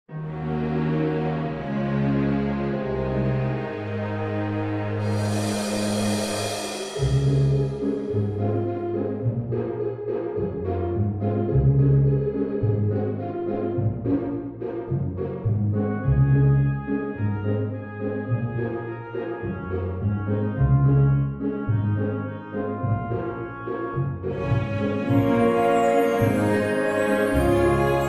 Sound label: Orchestral Arrangement